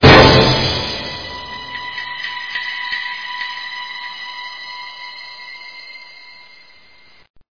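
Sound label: orchestra mystical moment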